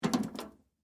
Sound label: Tool Box Pick Up, Industry, Tool, Box, Pick, Up, Metal, Case, Antique; Hand Tools; BlastWave